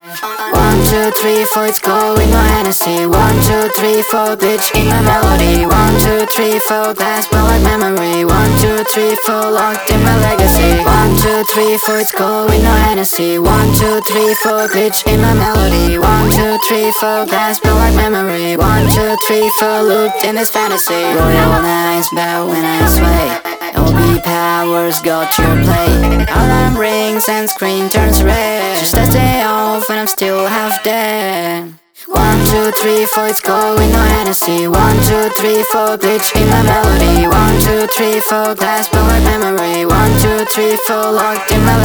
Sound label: looped in fantasy (drop) pitched up